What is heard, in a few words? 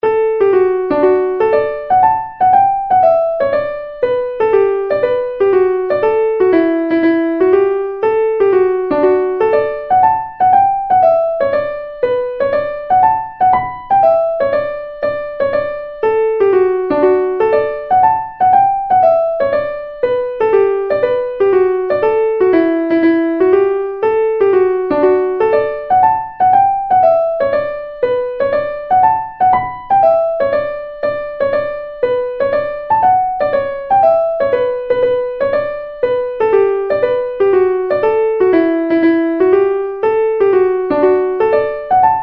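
Liverpool Hornpipe, Traditional Irish Music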